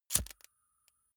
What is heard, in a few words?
lighter wheel switch on flame crackle